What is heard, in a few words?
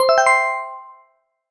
feedback correct; fraction robot collector